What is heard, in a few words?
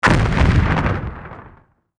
other destroyed